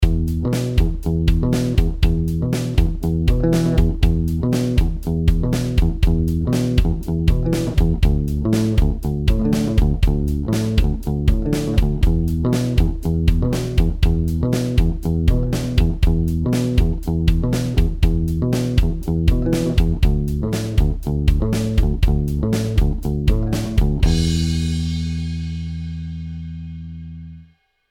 backing track, funk rhythm riff, This riff uses an Em7 and some palm muti; This riff uses an Em7 and some palm muting to create a funky rhythm; guitar